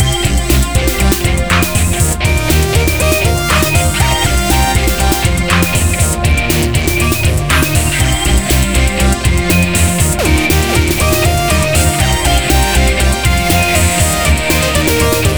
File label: Music